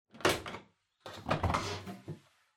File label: Washer Front Load Door Open